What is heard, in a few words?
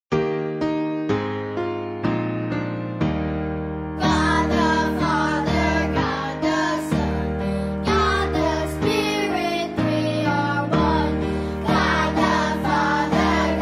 Singing Unto Zion Trinity Song